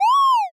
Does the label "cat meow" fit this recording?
Yes